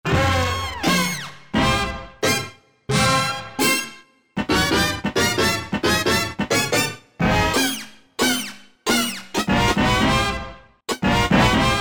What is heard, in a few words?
Brass Hits